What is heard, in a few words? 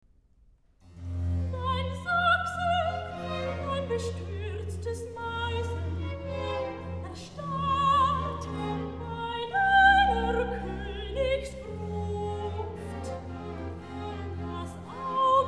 Recitative (soprano); Bach; cantatas; Lass, Furstin, lass noch einen Strahl